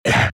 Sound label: Monster Grunt